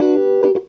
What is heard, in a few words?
CLAV GEL; USB Soundscan vol.32; Roots Disco; AKAI